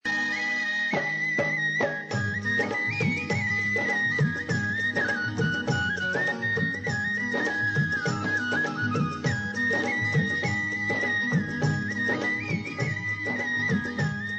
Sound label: krishna flute